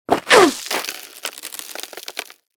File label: Cut Holy Land Intro-Kick